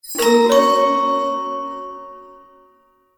stinger loot box lunar common; heroes of the storm full gamerip 2015

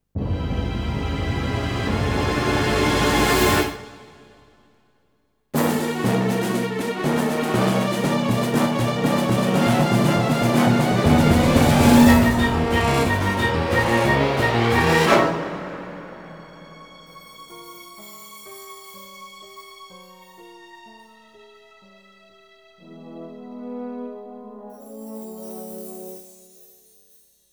Boulder Trap; Wallace & Gromit in Project Zoo; PS2

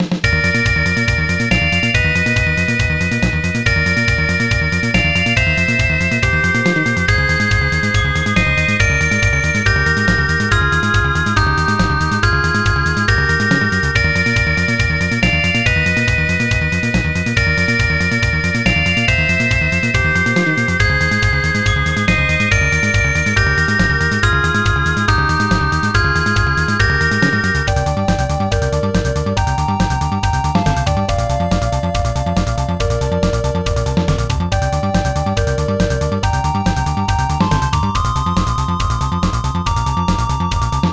Heavy Rain Warning; Little Master; SNES

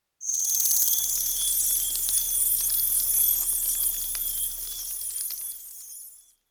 Field Mat Tap; Yu-Gi-Oh! Master Duel

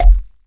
Coco Bounce; Ruins

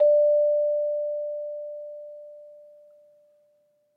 Musical Bellflowers

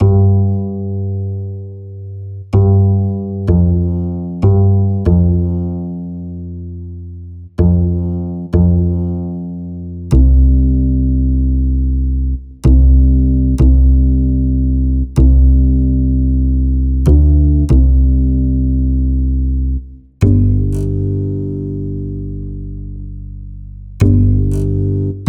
Bass

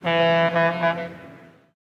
Boat Horn; Does Not Commute; android

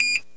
peepeep

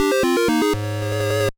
Unknown Jingle; Kamaitachi no Yoru Advance; GBA

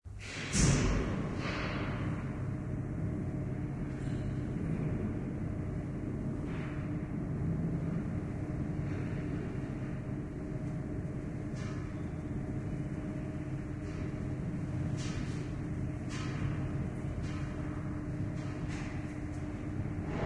Elevator Lifting Sound

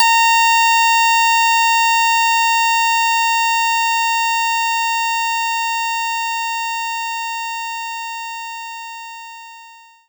E Piano; knights try

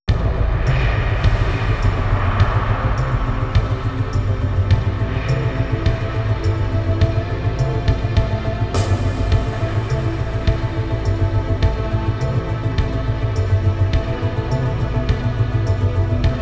Damage; broken helix original game soundtrack

